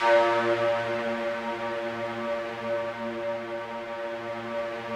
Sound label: FOG ATTACK, Yamaha VP-1; Music Store; 90's Sample CDs; FOGATTACK 3